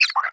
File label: vox fall, creatures, snark